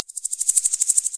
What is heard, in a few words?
Insect